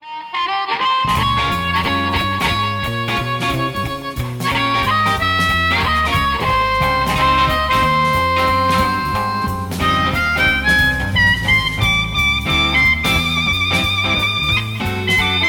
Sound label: Swing